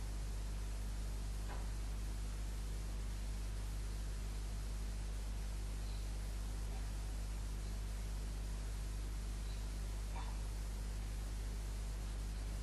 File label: window open